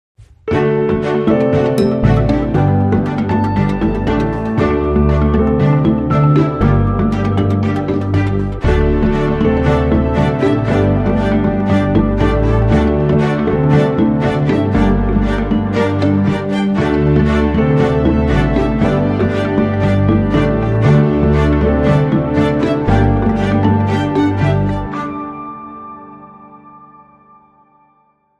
No Perc

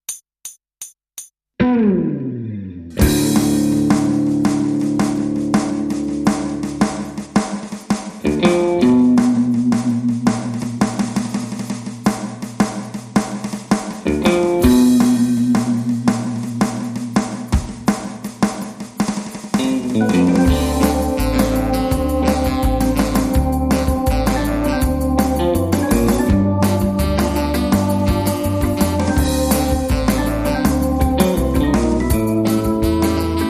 With Sax

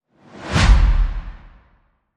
Sled Quick Flyby

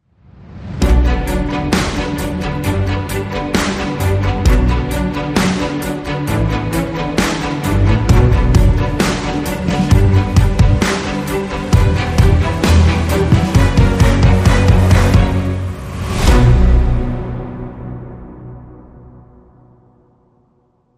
Hybrid Sting

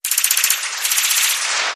Glitch Machine Sputter

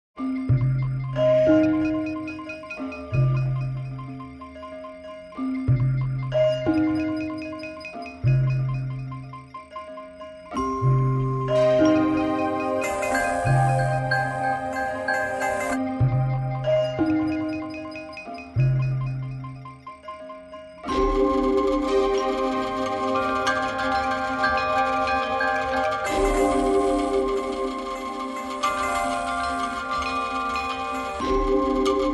No Percussion No Bass

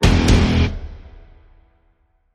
Stab Guitar Double (E)

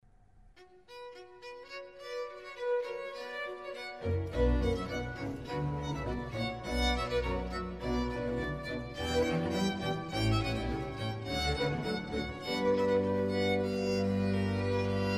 for Soprano solo; Laudate pueri Dominum; (Psalmus 112) RV600; Antonio Vivaldi

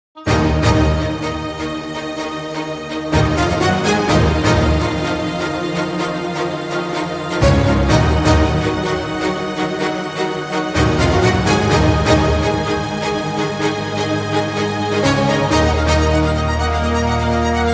Orchestra

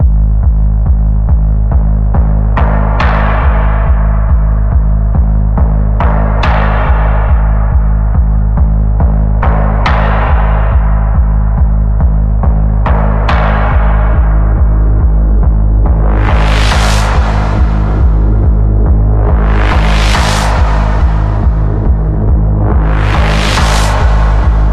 Deep Pulse, G sharp

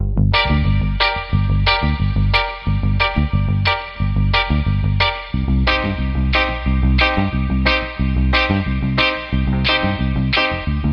Surfside Ska

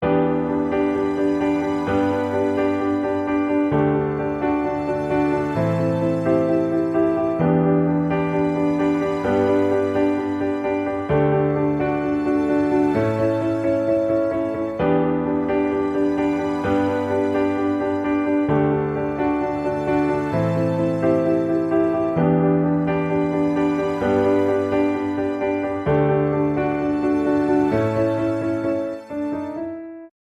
Piano Strings

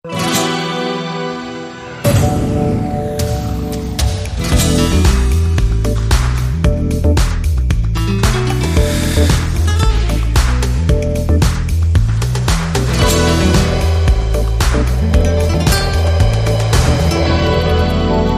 Spanish Lounge

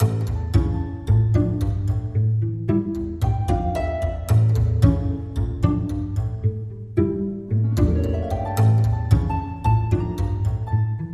Plucked

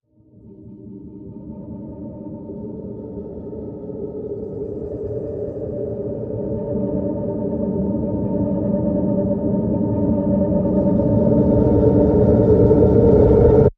Rise Short Landing

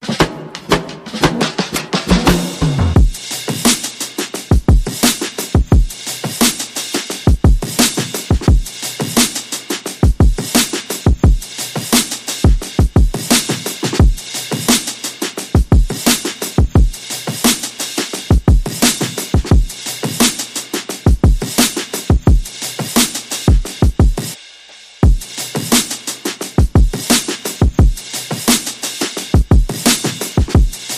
HipHop Beat

